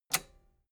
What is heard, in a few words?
metal button robust spring push enable